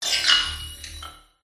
futurisitc mech slide sequence hybrid mobile collect special power up buff; epic stock media